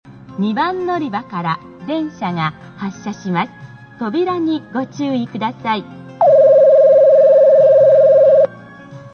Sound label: subway airport